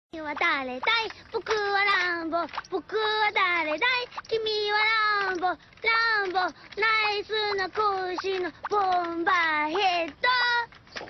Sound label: song